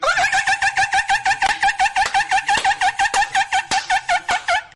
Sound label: dolphin laugh; flightreacts